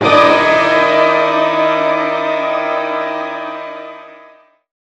hit

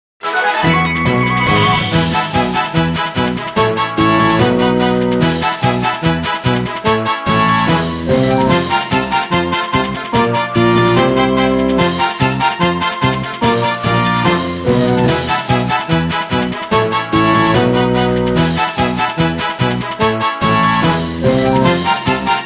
Field Victory; Mons Awaken

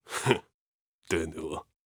laugh

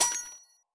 Coins; Idle Champions of the Forgotten Realms

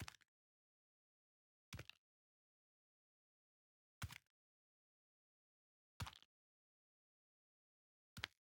Footsteps; Ending3; Devour

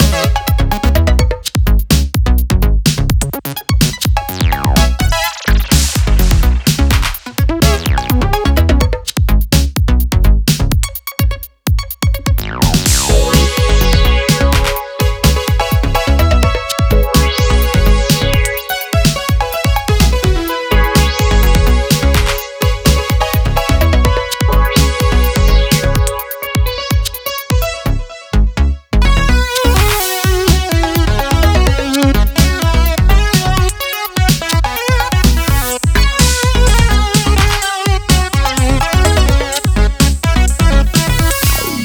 Happy Loop; Galaxy of Pen & Paper

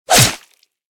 Warrior Overpower

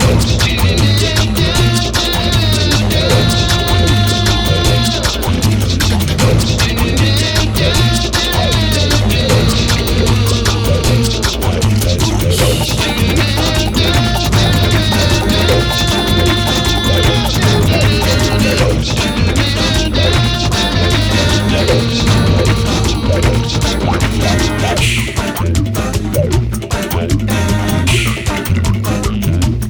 Music - Race Loop; Crash Tag Team Racing; Fossil Fuel

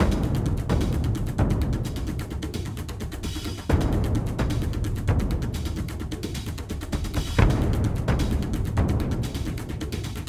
Mus Action Perc High

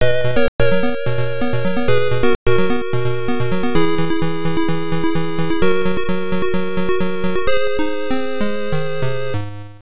Round Over; Blaster Burn Budruga Episode III; msx2; psg

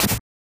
Land

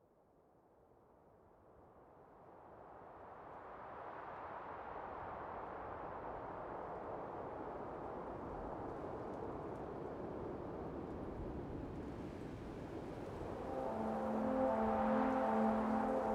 World Ambience; Factorio

